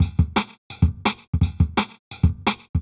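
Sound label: Jungle Breaks, 170 bpm; EarTest; Original Jungle Breaks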